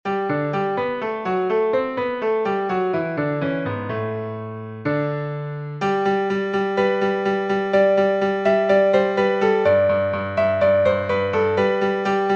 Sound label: piano sonata